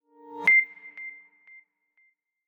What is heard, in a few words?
Echo, notifications